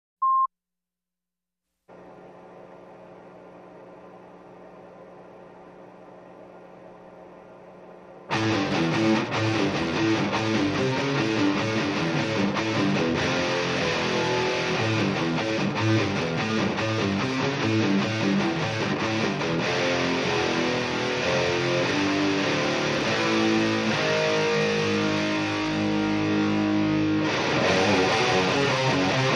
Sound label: Guitars Only